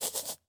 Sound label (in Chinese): 狐狸嗅闻声